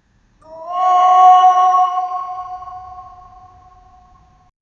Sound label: distant call to prayer